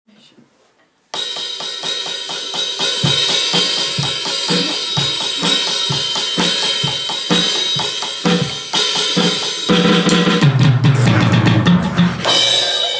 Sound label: drum composition